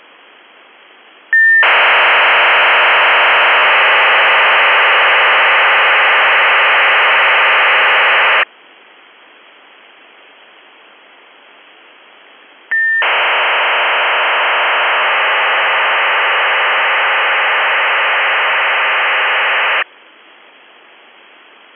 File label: RFSM 2400Bd CONNECTING